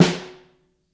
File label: Snare Overhead